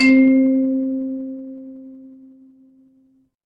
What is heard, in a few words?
instrument chime bar